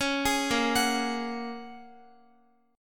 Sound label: Bb Minor 7th Sharp 5th, Guitar; Bbm7#5 arpeggiated